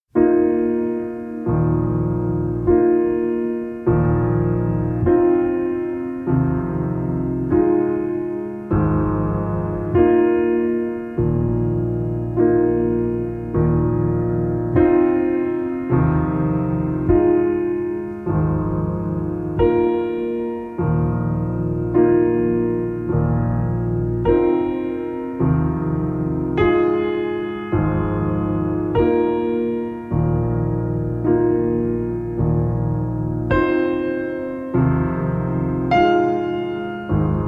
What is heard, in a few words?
Solo Piano